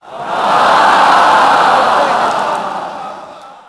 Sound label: big aah; WhiteOut; XBOX; tundra